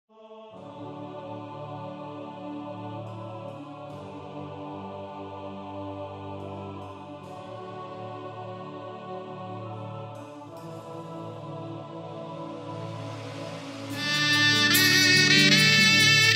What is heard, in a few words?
NATIONAL ANTHEM OF PAKISTAN Shehnai; Ustad Abdullah Khan Shehnai Nawaz شہنائ نواز; MAD Sessions